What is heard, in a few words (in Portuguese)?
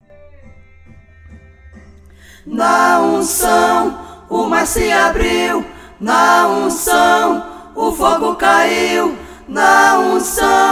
back vocal; studio manancial producoes